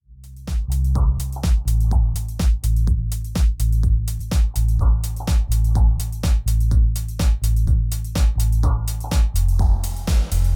Reverb

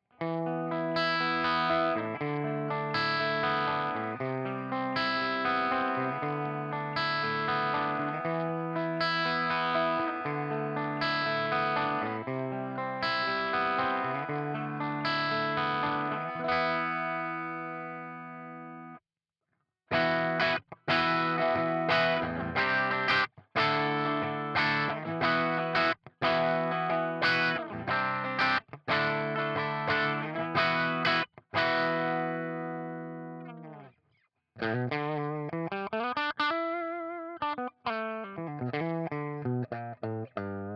Jackson SL2 bridge CLEAN

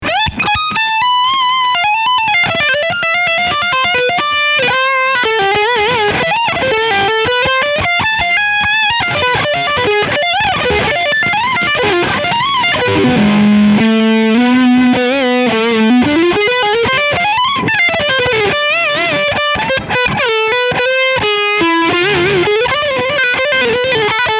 Leadline beide PUs

